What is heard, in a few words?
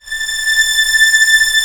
STR A4 PP; Sound On Sound; Peter Siedleczek's Orchestra